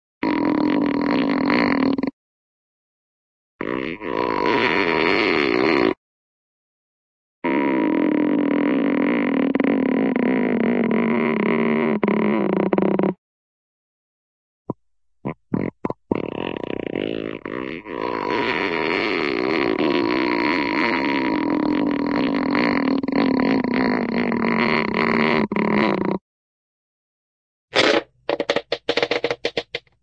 stretch, cartoon rubber stretch, short

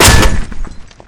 charge hit flesh

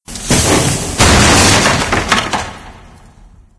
timed debris fall; Left 4 Dead 2; Source Engine

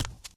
tile, footsteps walk; player; source engine; left 4 dead 2; boomer

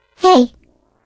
turret pickup

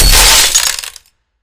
tv glasstastrophe

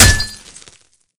bottle broken hit flesh

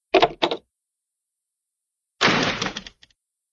door open, slam and rattle; cartoon; flintstones; hannah-barbera

